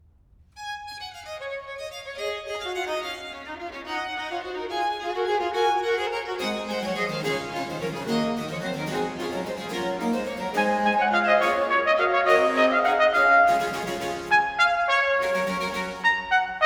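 Sonata for trumpet strings & basso continuo in D major - Presto; Purcell; Complete Chamber Music; Brilliant Classics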